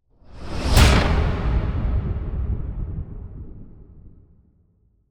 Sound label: Epic